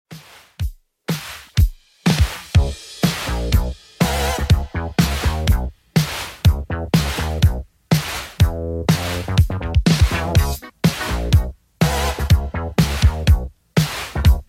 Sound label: 80s Redrum, 123 bpm